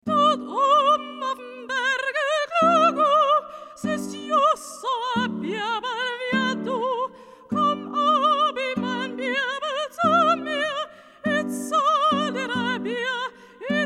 österreichische Lied für singstimme; Hess 134 Der Knabe auf dem Berge

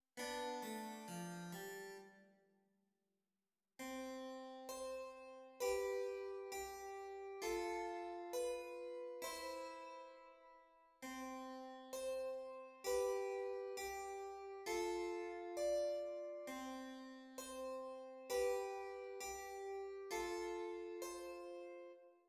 Zwei simmiger Contrapunkt; Ronge; appunti di Albrechsberger

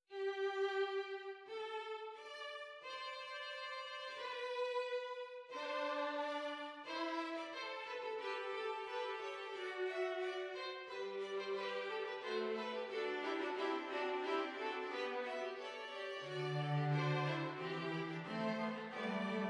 doppelfuge 4; Hess 243; non citata da Nottebohm; abbozzo Ronge; versione originale

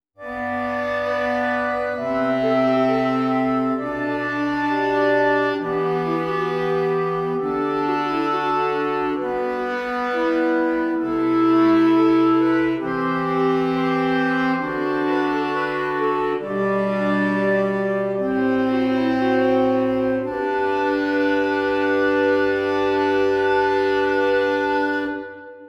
Stimmiger Contrapunkt; 5 Gattung; Ronge; corretto

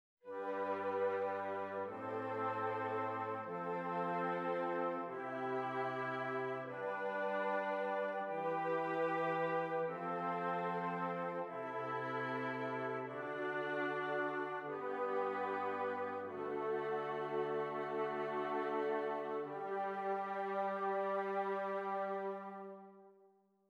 Zwei simmiger Contrapunkt; Ronge

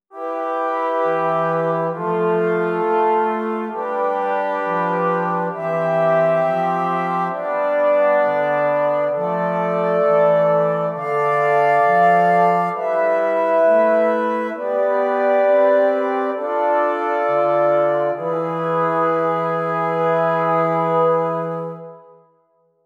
Stimmiger Contrapunkt; Ronge

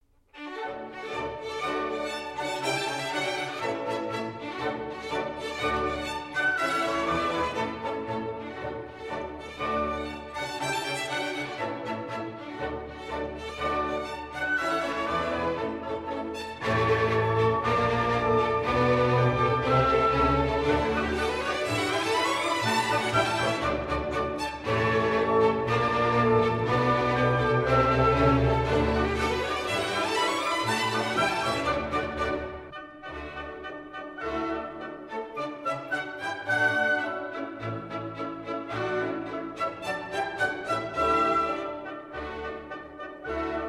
Contretänze für orchester; Tanz III

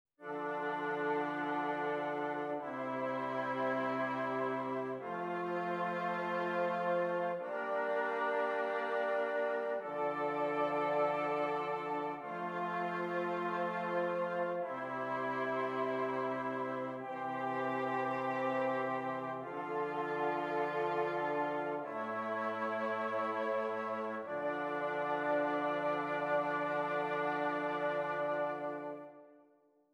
Stimmiger Contrapunkt; Ronge

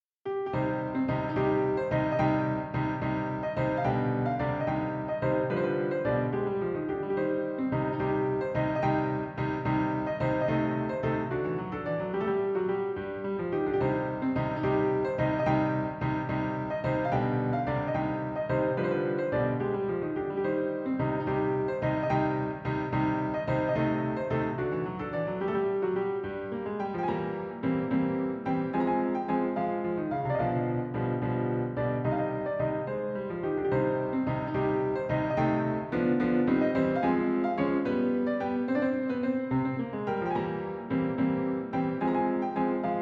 Bagatellen fur Klavier Risoluto

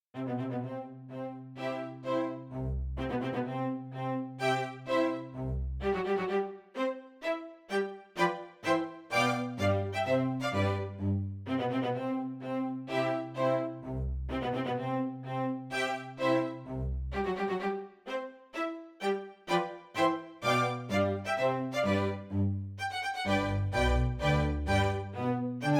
Menuette fur Orchester C Dur

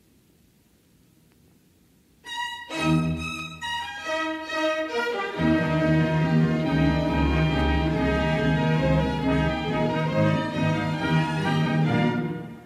Deutsche Tänze für orchester

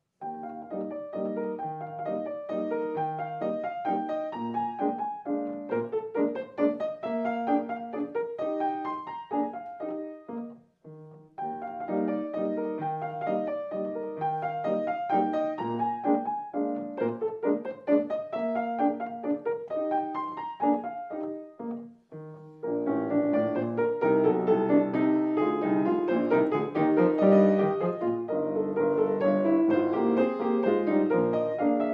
Modlinger Tanze Klavierfassung Menuet

